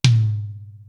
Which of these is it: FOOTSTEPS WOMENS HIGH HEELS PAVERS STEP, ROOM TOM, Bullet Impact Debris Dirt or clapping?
ROOM TOM